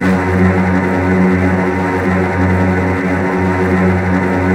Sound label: STR TREMOLO, Strings